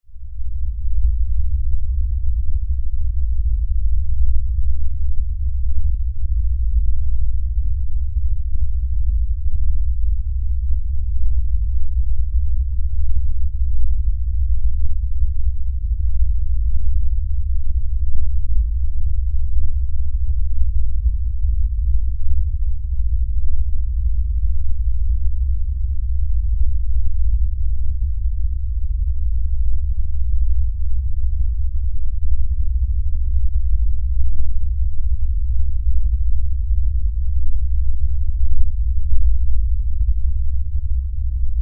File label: LFE Rumble, Lfe Rumble Low Bass